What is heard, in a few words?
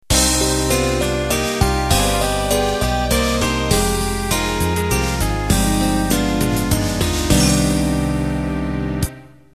Waltz End